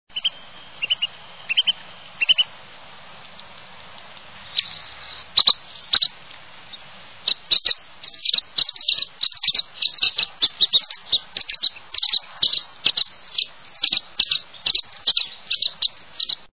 Pygmy Nuthatch

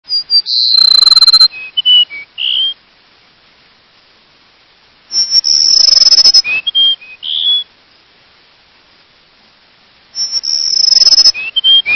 Cassins Sparrow

